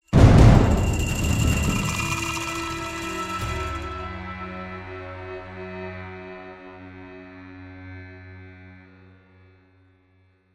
score Desert sting; Journey score Desert v4a S T R M

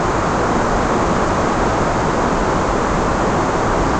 Ice Cliffs, wind; ice age scrats nutty adventure

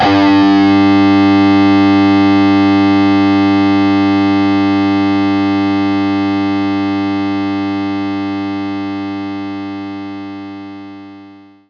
Overdrive Guitar G2

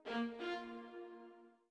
orbit violin; Sky Children of the Light gamerip

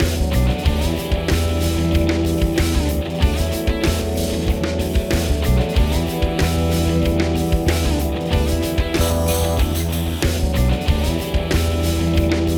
Loading Screen - Load Loop; super mario strikers gamecube

